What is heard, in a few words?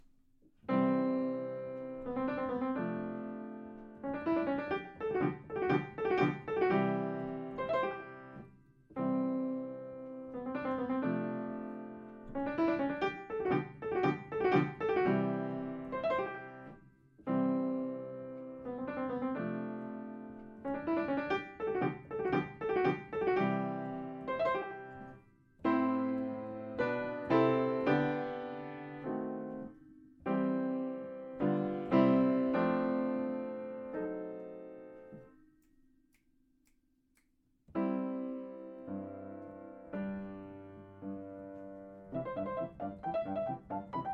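C major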